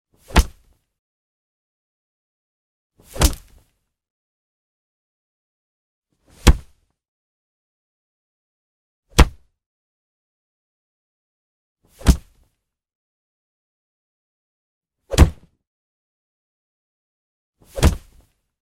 Kick 7X; Hollywood Edge; Martial Arts and Human Impacts

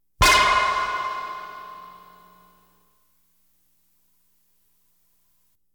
Science Fiction effects, Lasers, Light beams; Valentino Production Sound Effects Library CD50

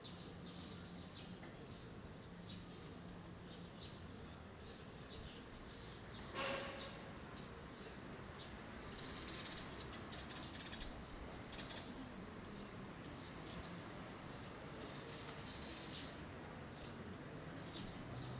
park, ambience; NS Studios - ultra sound collection

